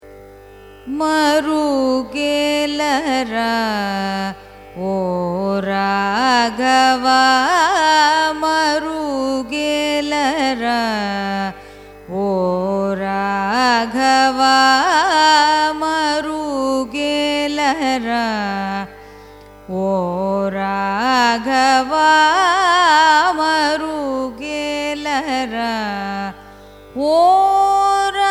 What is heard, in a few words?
raga jayanthashri carnatic; marugelara